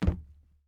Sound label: plastic land; teardown ps5 windows xbox series xs gamerip 2022